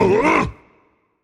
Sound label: surprised rage; chaac